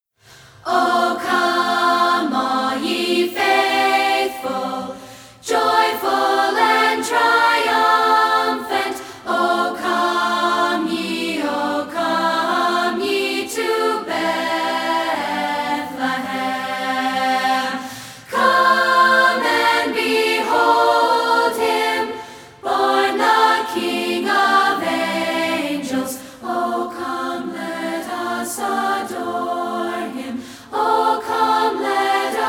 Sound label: VOICES-ONLY O Come All; O Come All Ye Faithful